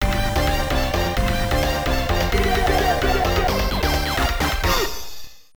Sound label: Match Start; Power Spikes II; Hyper League